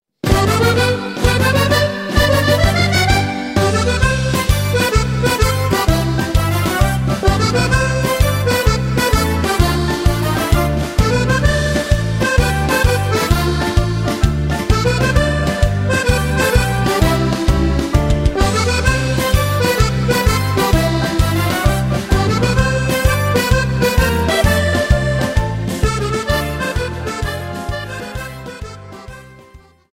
musette tarentella

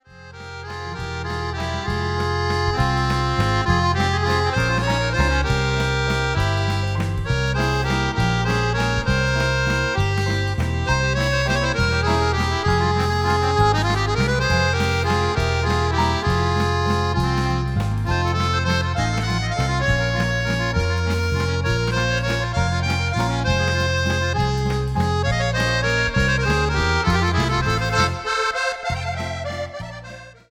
guinguette, valse

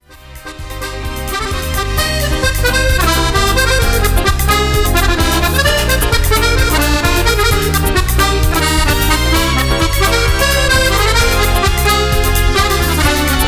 la samba du bal musette

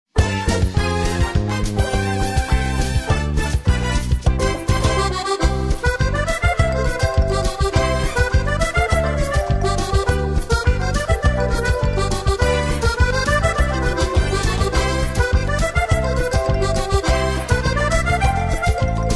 extrait tradition samba

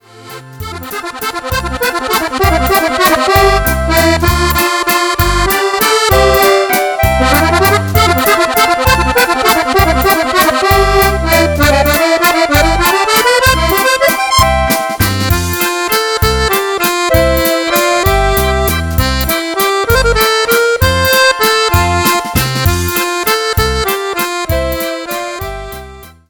valse

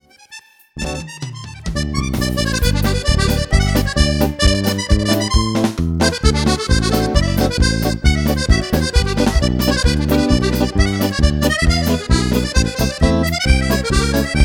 tyroli polka, accordeon

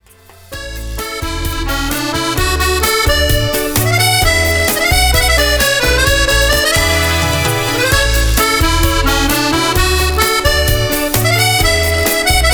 mojito accordeon

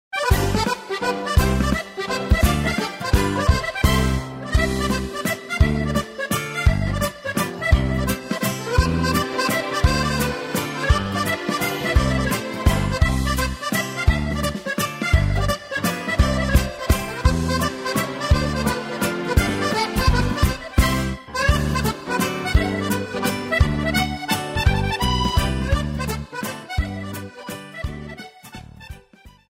mazurka

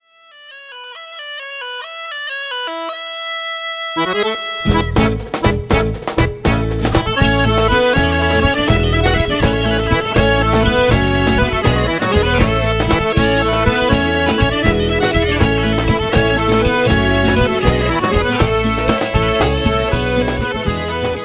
bourrée

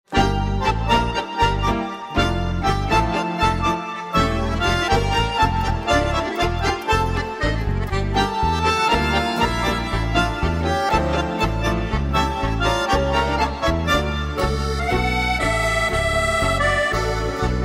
le tango musette

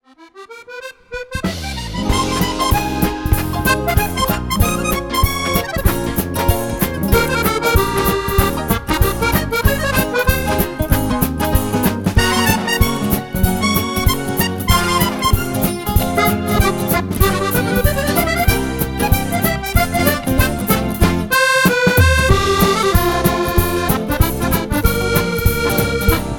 fox trot, musette

